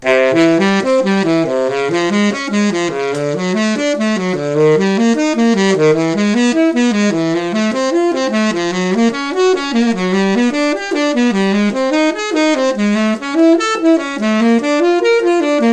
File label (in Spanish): Larsen 105 2MBC Legere TT Arpegios